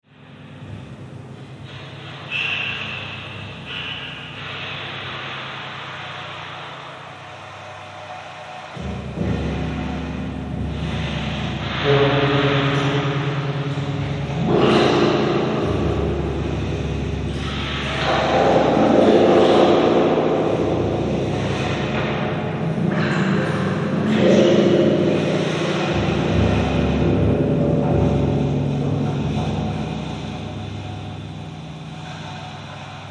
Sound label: acoustic chambers